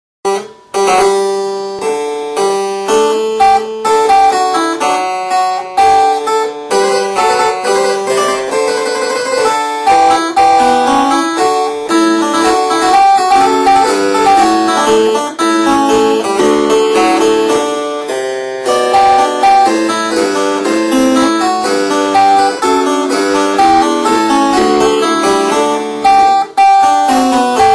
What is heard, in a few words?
spinett